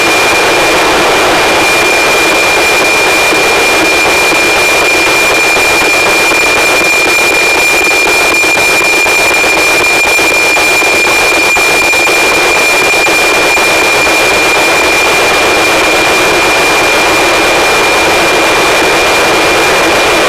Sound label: noaa19